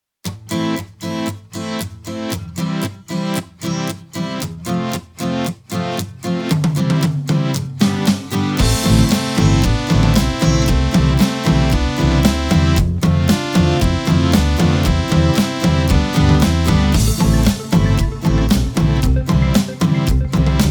Synth Pop

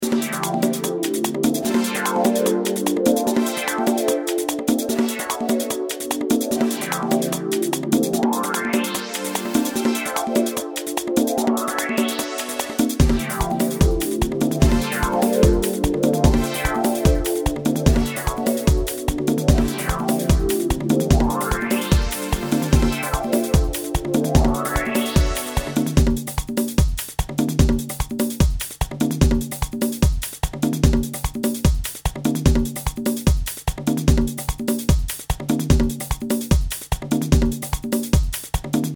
Vocal Housey

